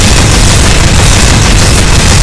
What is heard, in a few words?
sewing fire loop crit, weapons